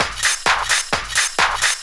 drum 130, techno trance drumloops

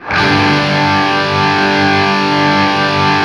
open G loop, guitar; East West; Steve Stevens Guitar Samples Collection; OP CHRD LOOP

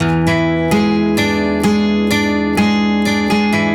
Guitar, 128bpm, E2, analogue circuit; musicradar

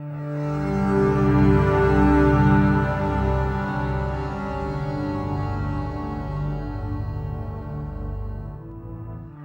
Ground Loop, Acoustic Drone; Sonic Foundry (Sony Creative Software) - Friendly Fire; DroneLoop

